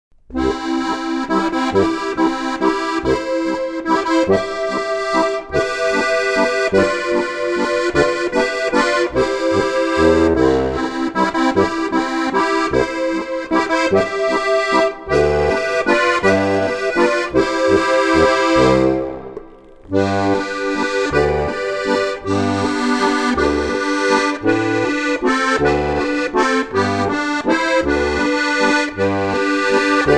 harmonika

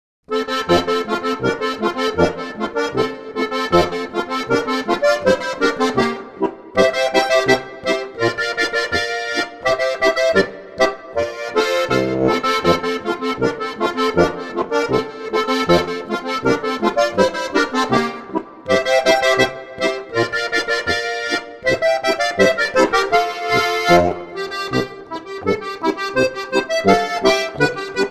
Boarischer; Ziagl-Boarischer

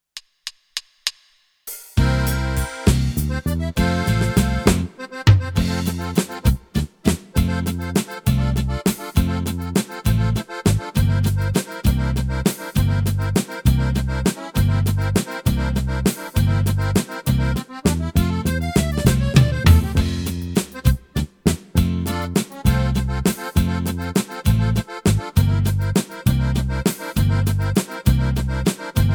Laendler ohne Melodie

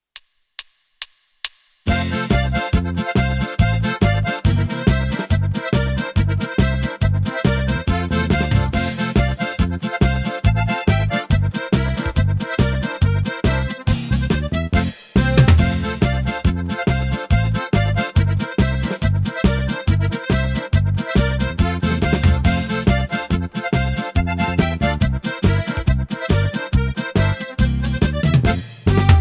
Polka mit Melodie

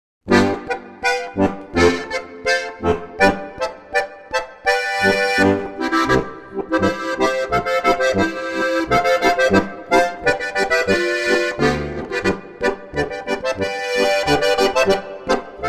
Boarischer

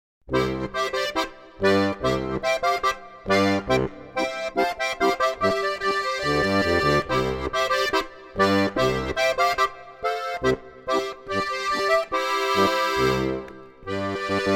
Festungsfanfare

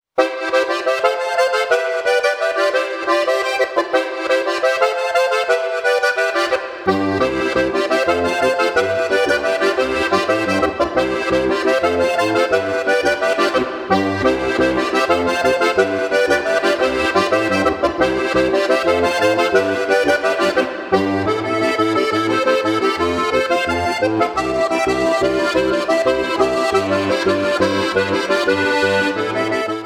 steirische harmonika

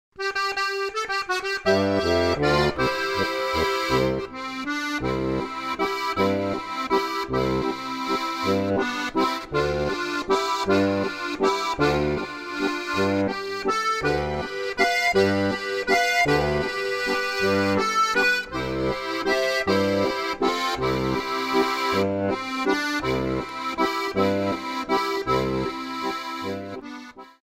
Schneewalzer

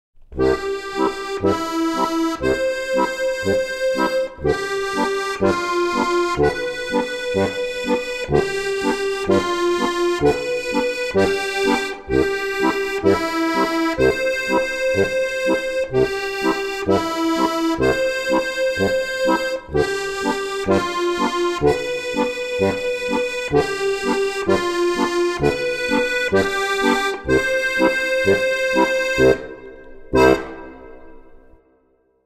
harmonika

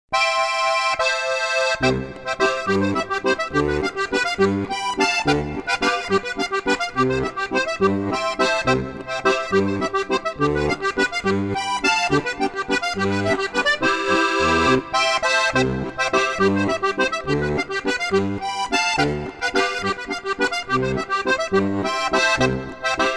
Harmonika, Akkordeon

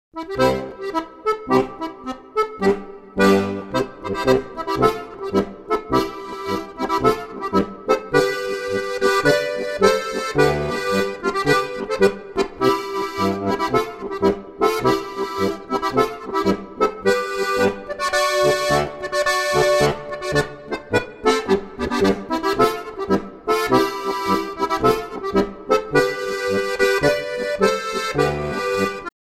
marsch

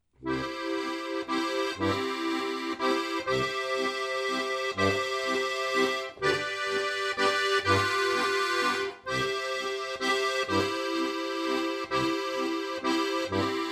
Walzer

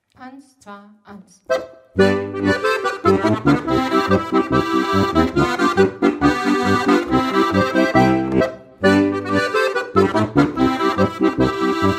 polka

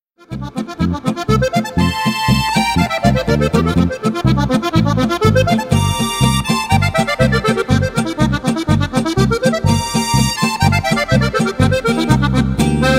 Harmonikafreude

